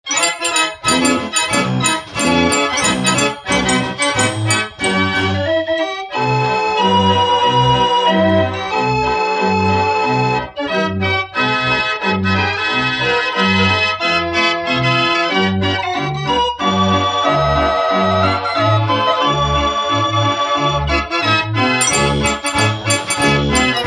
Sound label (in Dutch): draaiorgel